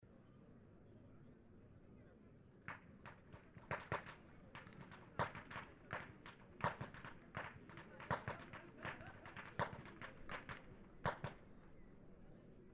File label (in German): schnalzen, jugend; waging